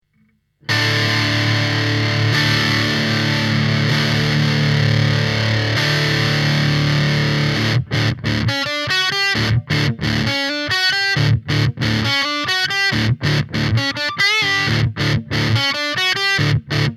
Pentode disto; lampes

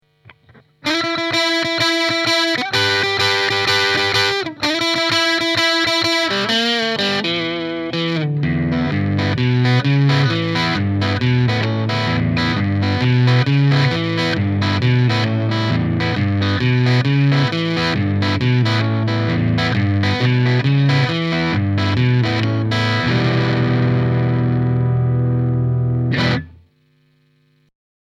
Strat Blues; lampes

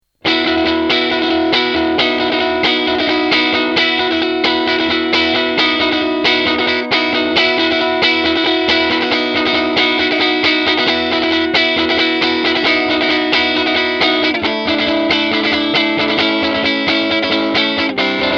20W clean; lampes; british blue

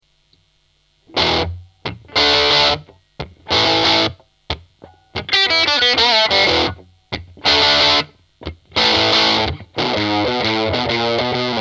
20W disto; lampes; british blue

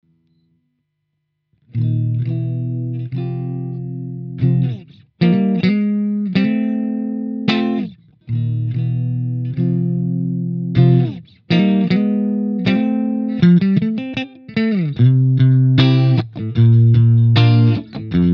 Clean split

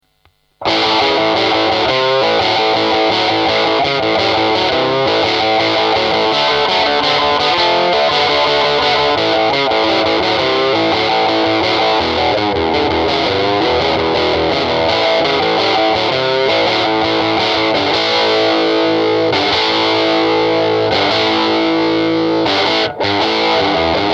5W crunch; lampes; british blue

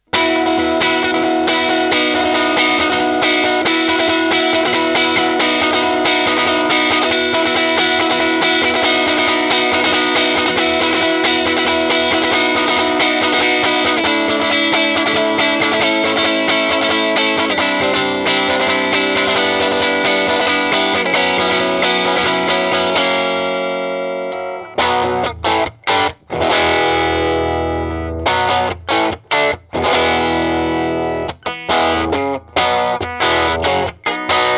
5W clean; lampes; british blue

